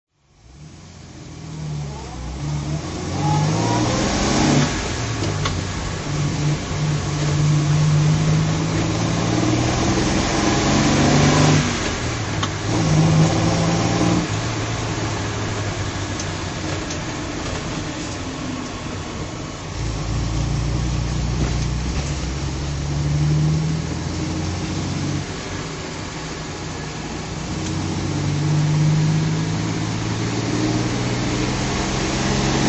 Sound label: lancia b20gt drive, Lancia Aurelia B20 GT series 6; 1957